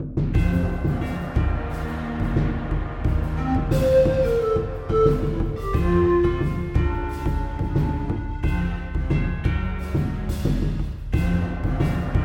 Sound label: BGM Temple Outside